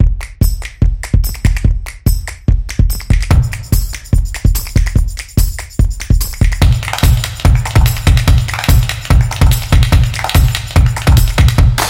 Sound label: Rhythm; fast tempo